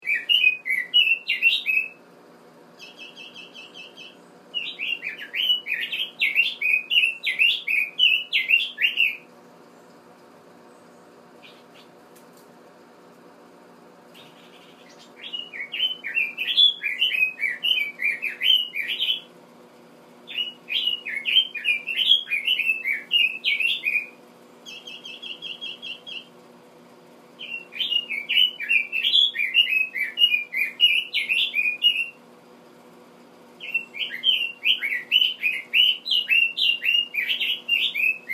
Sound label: leiothrix red billed duet